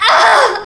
female death

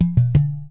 WRONG; морской бой

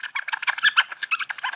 Rat Squeaks

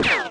miss hit

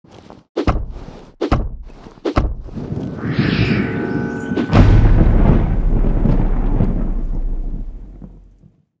Earthquake Release

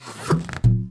Tooltip In; Worms3D

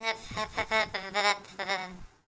scared; Worms3D; Whoopsie

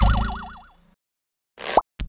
Recover; Worms3D

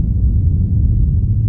earthquake loop; Worms3D

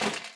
spin stop; Wormpot; Worms3D

